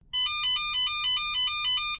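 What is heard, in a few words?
signal of digital radar detector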